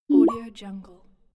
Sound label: digital web notification